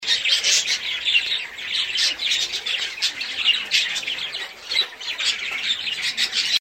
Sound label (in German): Wellensittiche